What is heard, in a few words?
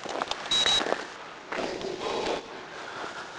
outside